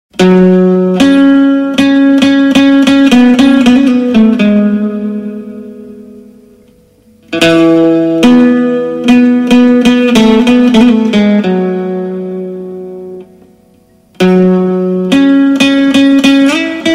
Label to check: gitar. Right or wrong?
Right